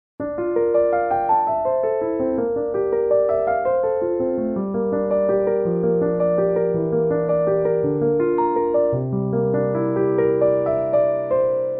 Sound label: piano sample; Debussy; Arabesque No.1